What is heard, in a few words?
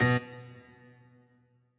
piano riff intro